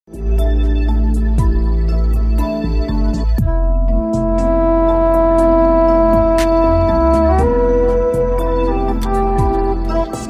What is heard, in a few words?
sax dream